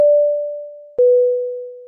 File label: airplane ding dong